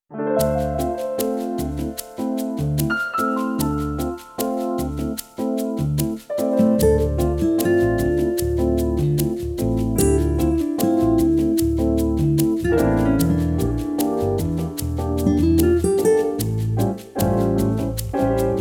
chat bg music; seedlip

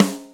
snare drum; drums

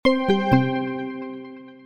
close

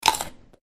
chomp

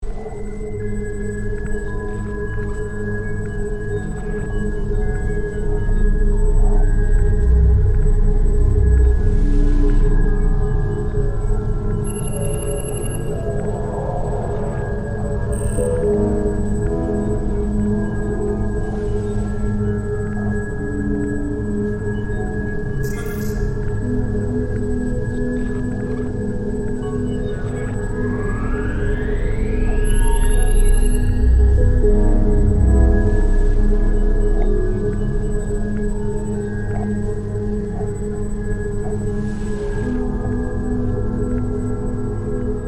background neutral

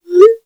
livechat notification

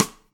snare stick, drums; standard

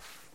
grass rummaging

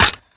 broken tool

